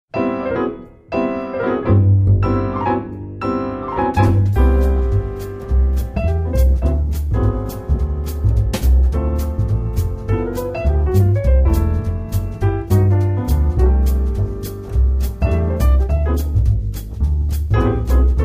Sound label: instr Trio